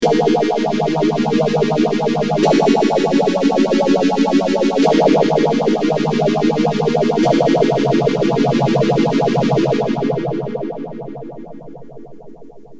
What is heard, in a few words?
m1000 nady ps019